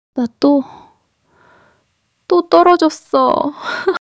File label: crying; monologue